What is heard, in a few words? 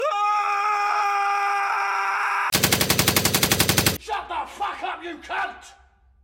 guy dying; p90